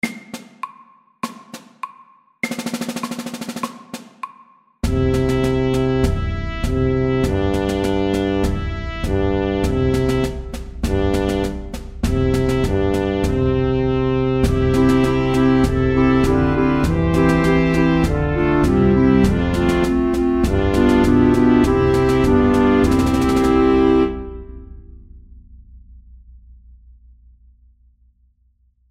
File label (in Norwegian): Kryssmarsj MEDIUM 100